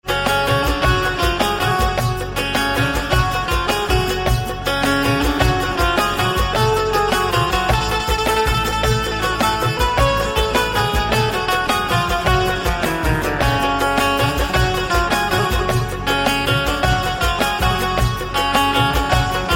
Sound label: Banjo